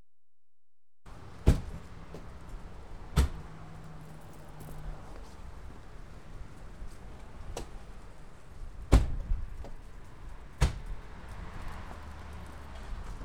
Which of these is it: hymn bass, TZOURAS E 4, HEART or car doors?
car doors